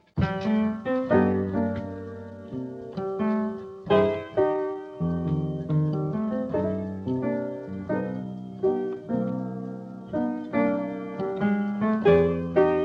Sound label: solo piano